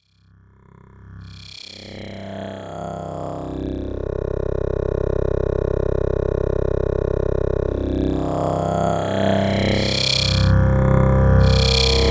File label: Sequential Pro3 Wavetable Pad